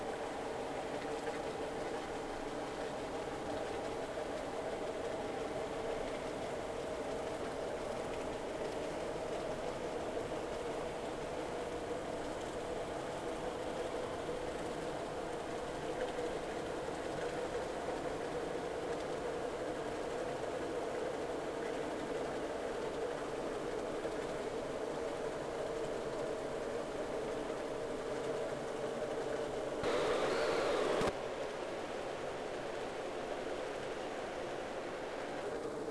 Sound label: Whales; SeaSnake